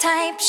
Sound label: vocal